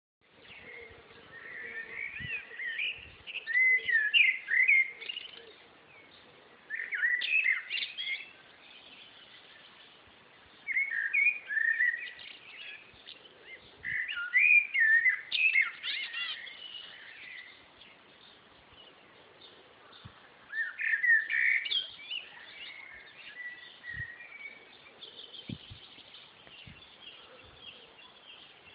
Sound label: blackbird singing